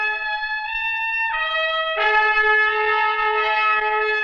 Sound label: horns, sad loops